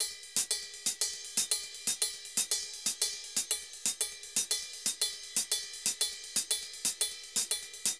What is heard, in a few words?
Ride Samba 120, Drums & Percussion; Best Service; Festa Latina VOL-1